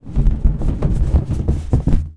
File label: balloon inflated handling; zapsplat